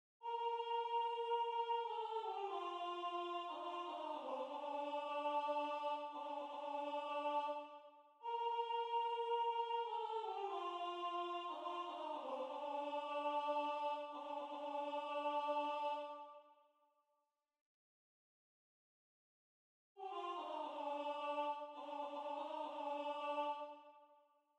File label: na nda alto